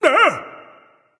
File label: ouch; smite; thoth